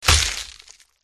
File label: attack contact; murgul